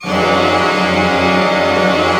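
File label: cluster fortissimo, Orchestra; Sound On Sound - Peter Siedleczek's Orchestra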